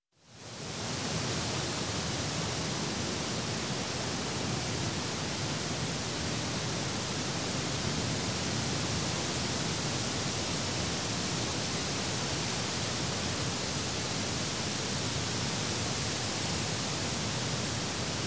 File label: Wind through Trees, by Seashore, Weather; Sound Ideas 2000 Series